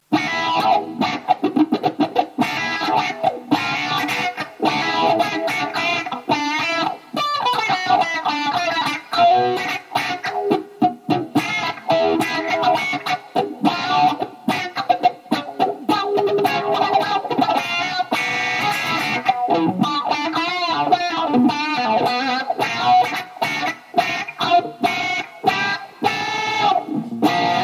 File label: live guitar jam using Line 6 Spider 4 120 watt stereo amp